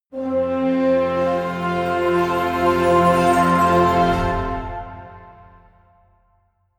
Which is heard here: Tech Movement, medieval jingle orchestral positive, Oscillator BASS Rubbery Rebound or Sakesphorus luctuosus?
medieval jingle orchestral positive